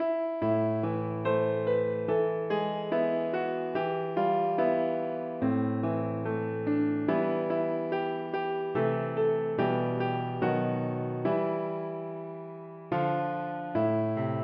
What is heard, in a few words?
piano